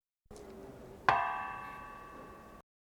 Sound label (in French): cloche; Mosset, cloche 1